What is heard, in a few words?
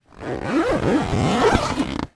foley bag holdal polyester zipper from inside bag; zapsplat